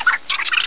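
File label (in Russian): мышиный писк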